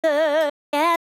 vocals, 130BPM; Pony Fan Music Remix Stems

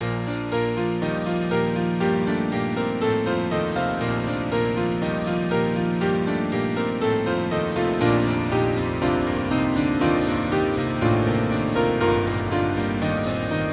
Piano Cover

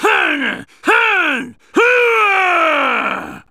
Grunt; Lewd SFX

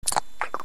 slosh; Lewd SFX

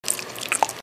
Suck; Lewd SFX

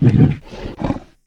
Bullsquid idle; BUGBEAR

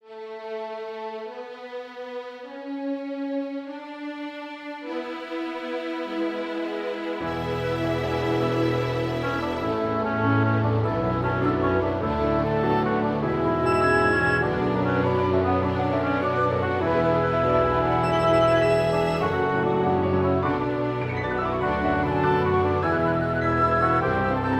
Nature Ensemble Remix

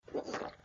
Swallow; Lewd SFX

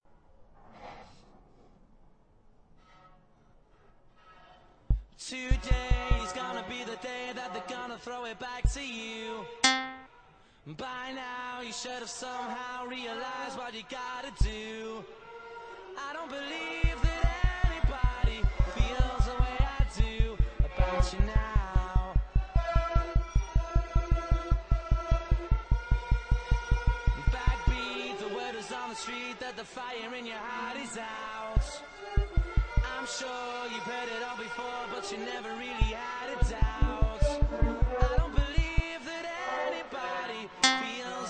Dubstep Cello

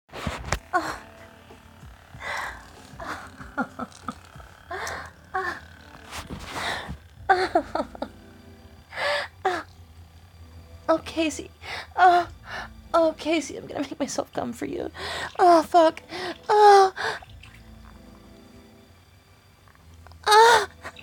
short orgasms

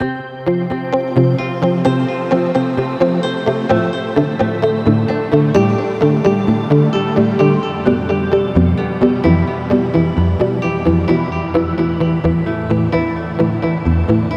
Guitar Arp; Nexus

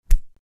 Smack; Lewd SFX

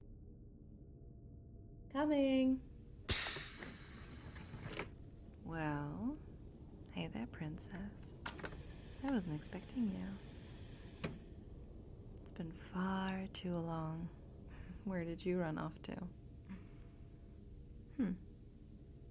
Spaceship Noise, Spit; The Pleasure Planet

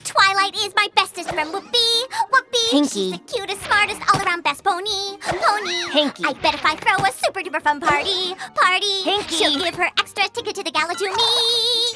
Ticket Song (Vocals); My Little Pony OST Repository; Season 1; Bonus Music

